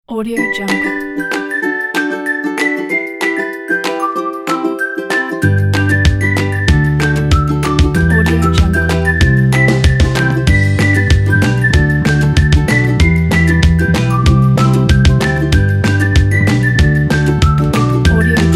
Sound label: cheerful trip